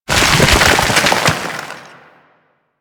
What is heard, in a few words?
Fusion Pillar Fall; Warframe; Warframes; Qorvex